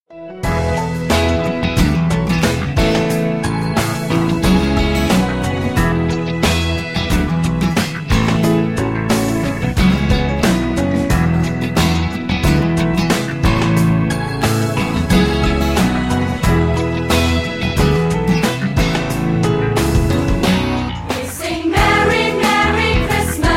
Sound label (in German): Lied